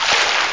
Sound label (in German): wasser; GeoWorld-Demo